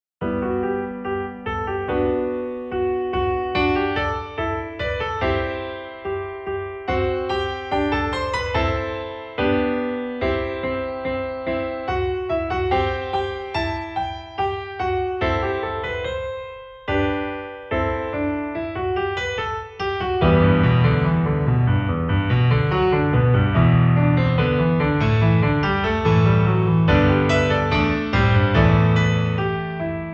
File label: Piano Interlude